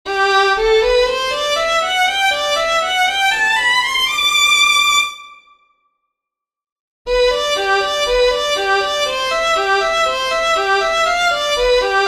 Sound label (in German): ganze applikatur, Violinspi; dommer 1865; Ganze Applicatur nennen viele Violinspieler, zum Unterscheid von der mezza manica oder halben A